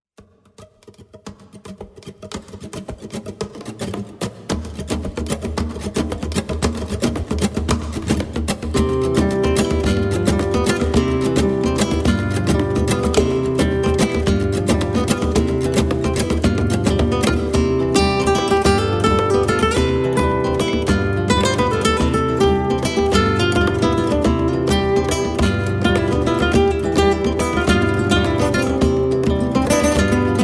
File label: azulejos